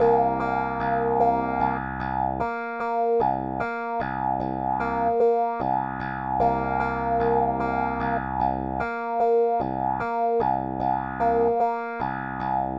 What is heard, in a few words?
clave 2nd line; Exercice; Funk Piano; Clave Second Line